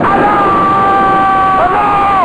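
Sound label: Alarm; U-BOAT 1.5.3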